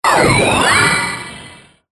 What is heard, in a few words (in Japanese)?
機械起動; モノラル